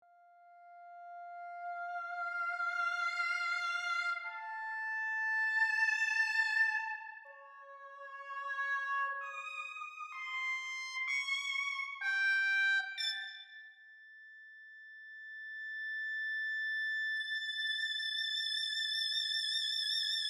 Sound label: EWI